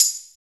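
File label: TAMB, tambourine; East West - Phat & Phunky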